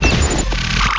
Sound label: transform